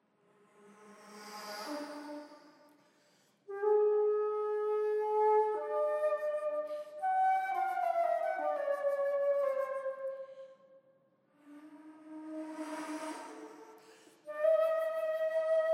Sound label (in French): Incantation pour flute en sol